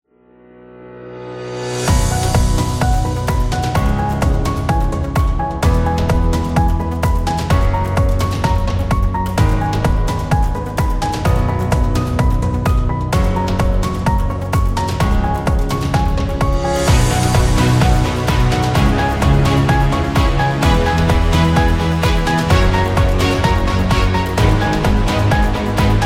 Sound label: Energetic; friendly